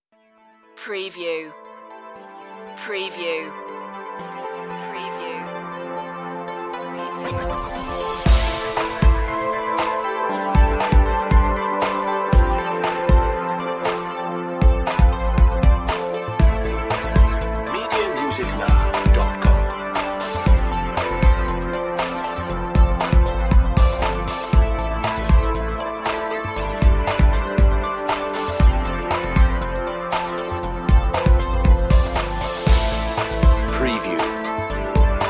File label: come save me instrumental